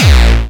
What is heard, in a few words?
Bounce Kick